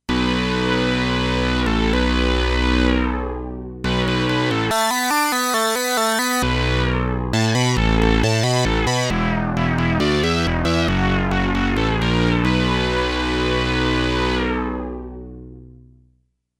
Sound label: Martian Hop